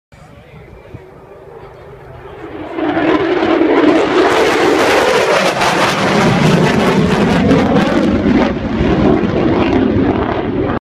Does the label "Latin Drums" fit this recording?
No